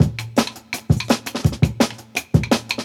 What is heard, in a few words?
10 Pin Beat; Original Jungle Breaks